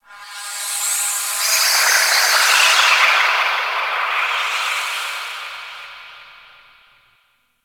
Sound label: Wind Small; Warframe; Ambience; Orokin Moon